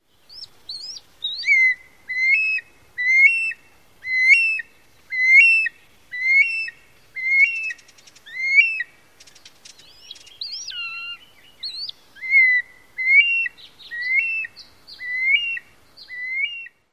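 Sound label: calls, bird; AZ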